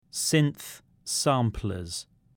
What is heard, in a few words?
synth samplers